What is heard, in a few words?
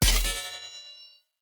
Short Sword Medium Parry Perfect Block Impact, Weapon Impacts; Player; Swords; Combat